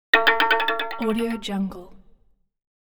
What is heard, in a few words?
violin bow bounce